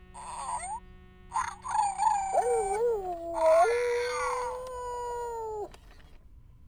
owo; BB Retail Therapy Sample Pack

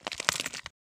rock falling

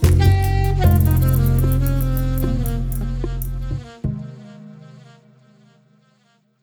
Sax Outro; BB 2HTC Samples Vol 4; Pads

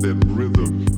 Rhythm; Weird

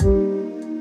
Layered Kick, Drums; BB 2HTC Samples Vol 4

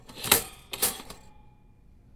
Toaster; BB Retail Therapy Sample Pack

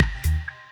Clack, Drums; BB 2HTC Samples Vol 4

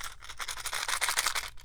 Shake Nail Box

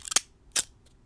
Jagged Scissors; bb - Smol Mechanisms

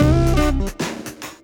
Hot Guitar; BB 2HTC Samples Vol 4; Weird

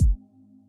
Nice Kick, Drums; BB 2HTC Samples Vol 4

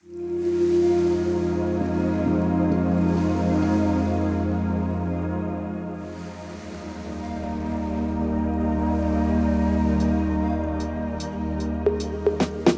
The Pads Grow; BB 2HTC Samples Vol 4

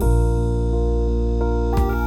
Rhodes, Pads; BB 2HTC Samples Vol 4 Addendum

